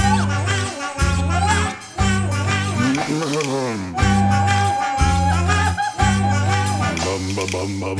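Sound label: food world music inferno suspense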